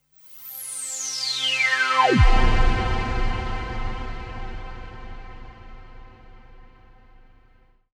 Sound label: Virus Tone Drop (C2)